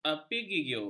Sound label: arpeggio